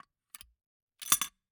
Gumball machine, candy door, plastic, open, drop, wide; Eiravaein Works; Vaeyan IV